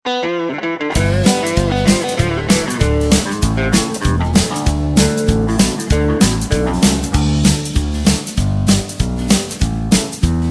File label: (D To Eb) No Backing Vocals